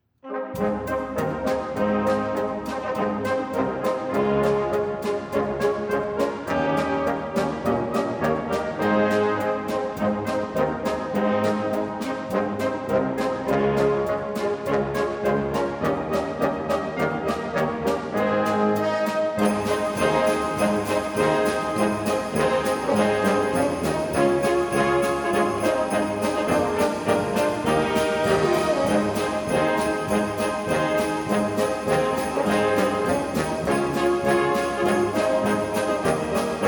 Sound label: jingle bells, brass; xmas